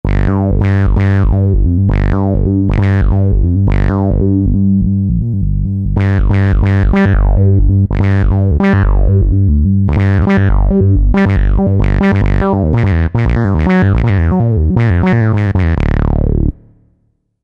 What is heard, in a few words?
Saw 303; Korg TR-Rack; Saw303Devotee JS